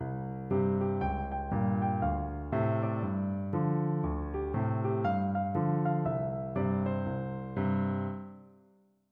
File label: Stride Piano